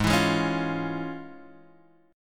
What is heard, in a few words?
F Minor Major 7th Sharp 5th, chord